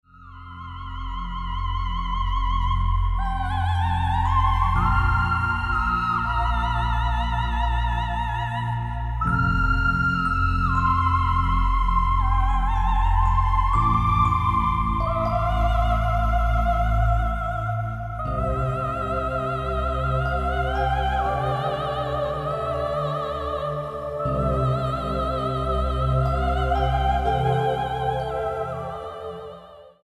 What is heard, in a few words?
Future Pad